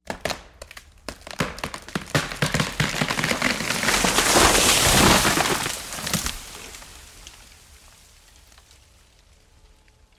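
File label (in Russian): стихийное бедствие падает дерево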